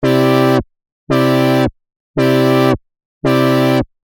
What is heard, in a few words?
lfo filter